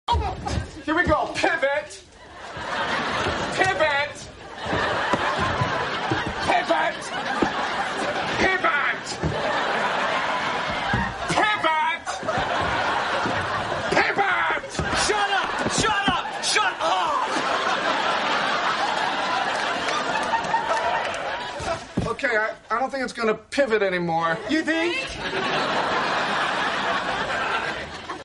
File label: friends music